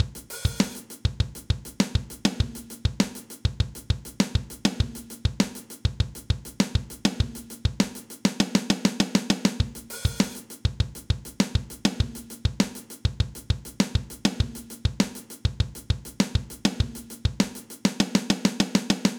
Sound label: 100bpm Ambient Loud Punch Lite Stick, drumloops